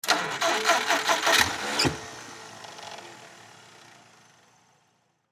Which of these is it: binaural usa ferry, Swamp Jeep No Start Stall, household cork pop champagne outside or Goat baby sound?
Swamp Jeep No Start Stall